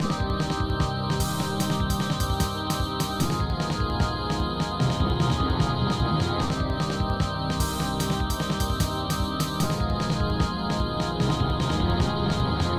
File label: heartfelt battle intro